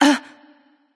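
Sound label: surprised, female; smite; voicepack; hera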